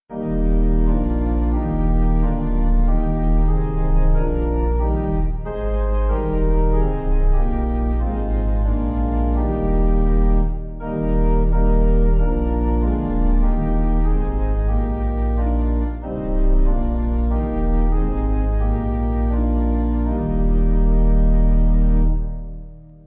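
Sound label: Pipe organ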